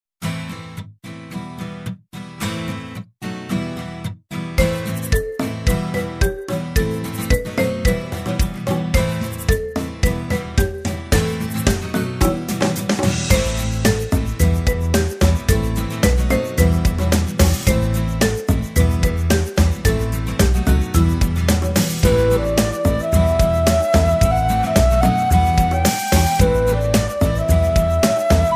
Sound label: Rap instrumental; MISEREOR